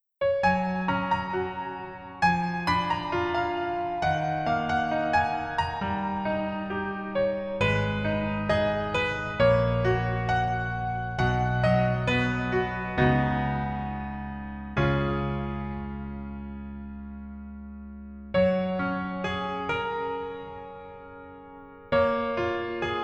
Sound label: Piano ver; 그 남자의 기억법